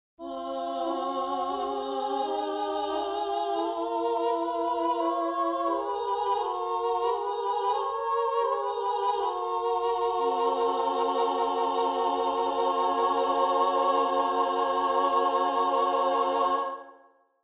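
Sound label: solo female, chamberlin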